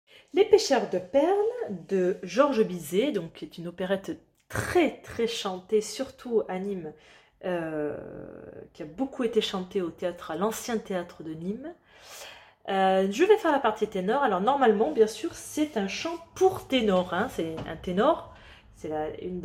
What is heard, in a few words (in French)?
tenors; Pecheurs de perles